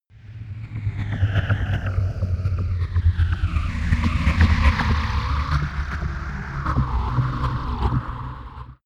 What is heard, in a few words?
scout ship, raumschiff